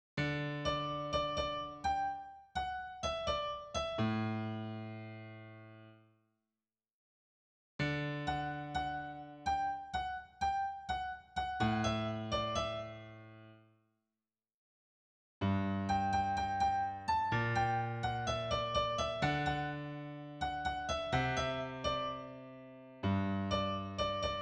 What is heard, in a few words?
piano